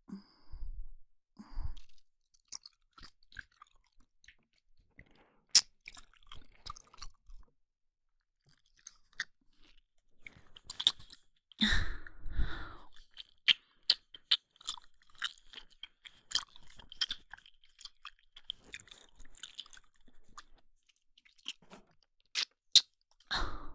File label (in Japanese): 耳舐め, ASMR; SEなし